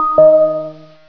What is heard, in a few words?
Internal Speaker Test; bayarea; SFMuni